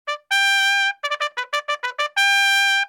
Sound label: Bugle